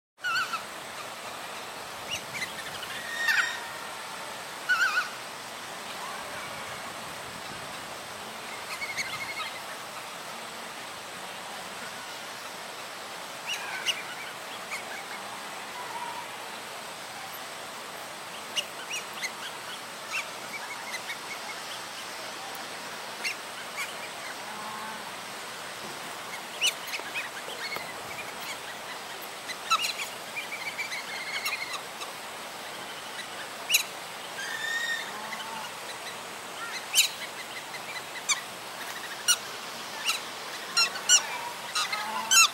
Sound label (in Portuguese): canto do marreco arboreo